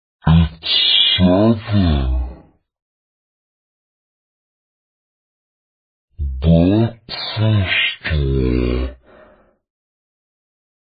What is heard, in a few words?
clock